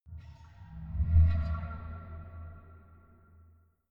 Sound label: ringing impacts